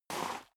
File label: step boots gravel light slide short; schritte